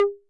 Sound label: Conga Hi 808 Saturated B